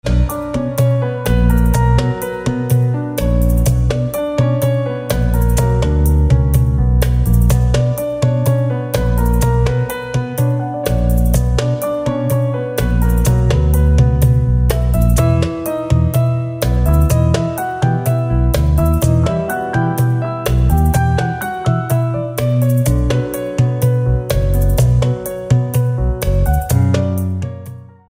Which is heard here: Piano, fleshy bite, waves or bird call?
Piano